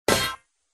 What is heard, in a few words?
"Ahhhhh!" theme